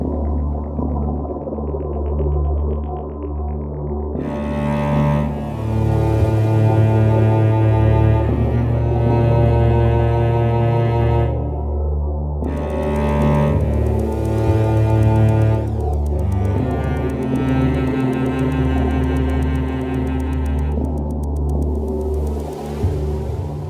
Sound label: Music Main Theme intro loop; Foreclosed